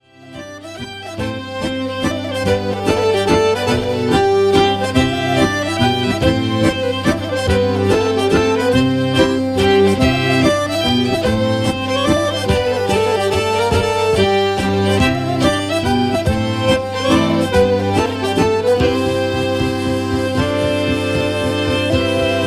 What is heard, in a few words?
scottish, mazurka, bourree; tradethik productions